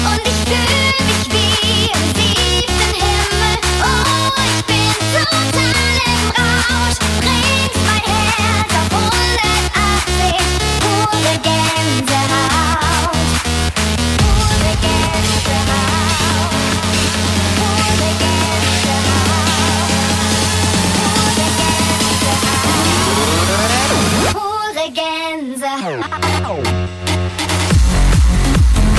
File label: Trance Remix